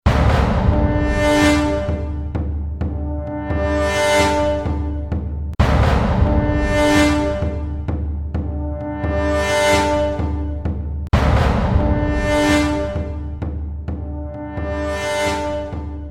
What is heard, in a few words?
Combat Tuatha Alternate Intro; Kingdoms of Amalur Reckoning